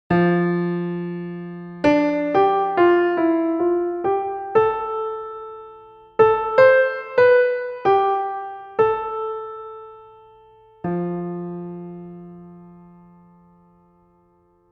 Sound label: Modal Improv